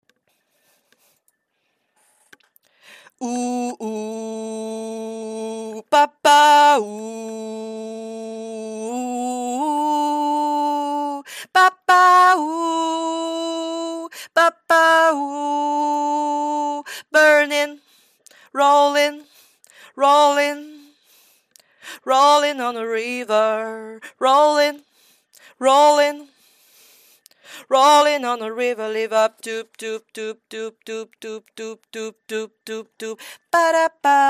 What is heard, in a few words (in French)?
tenor; Voix Ténor (TT); L’intro de notre chorale, qui lance chaque concert.